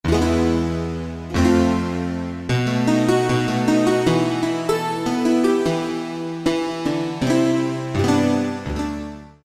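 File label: 12-string; Factory Presets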